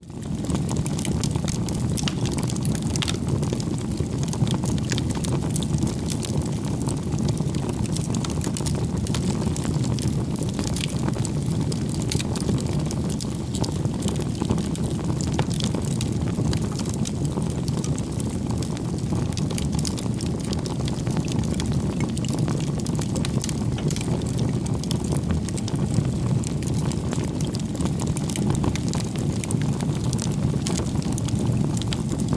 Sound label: fire